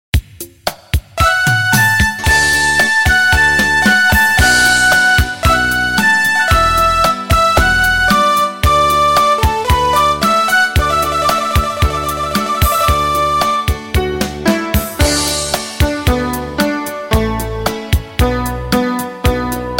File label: beat